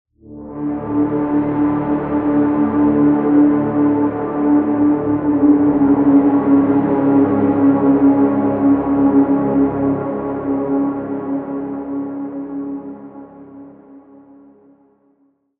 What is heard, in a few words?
Pad; Interconnection; Routed Sound; Vital Expansion - Reality Maker Vol.1